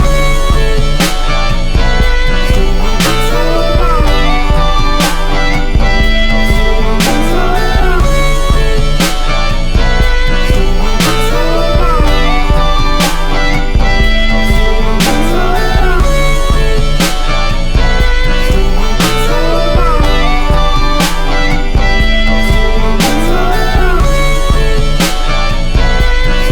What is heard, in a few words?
beat; Pony Music Archive